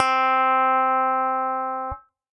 Studio Clav; Piano & Keys; Ableton; Factory Packs; Chop and Swing

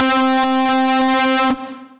Buzz Wrecker, Synth Lead

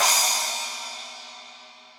SPLASH edge crash, Cymbal; Ableton; Factory Packs; Drum Booth

